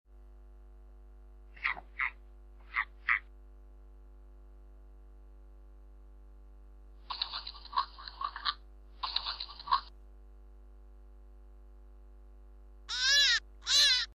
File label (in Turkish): Tavsan Sesi; dev velikan tavsani; Karisik Sesler